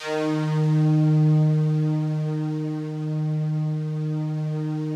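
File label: FOG ATTACK D#2 Left, Yamaha VP-1; Music Store; 90's Sample CDs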